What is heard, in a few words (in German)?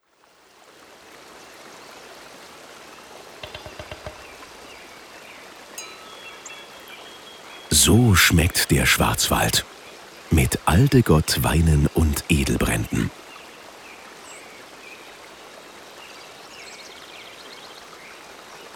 Warteschleife; Alde Gott